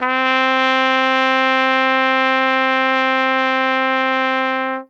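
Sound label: trumpet C lo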